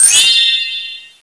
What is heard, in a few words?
alarm, weapons